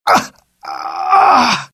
death, pain; dialogue; IT; mohaa

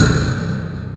enemy hit; scummvm; The Griffon Legend

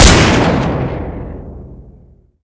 357 shot; xash3d fwgs; urbicide

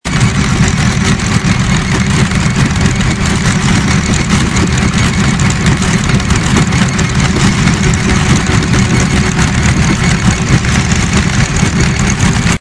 opel truck damage; vehicle; mohaa

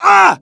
damage; dialogue; mohaa

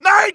hit, pain; mohaa

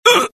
death, pain; mohaa; dialogue

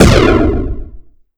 grenade; cdogs; funwithguns; techdemo

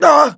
death; mohaa; dialogue

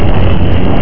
beam load; hurrican

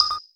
menu enter; cdogs

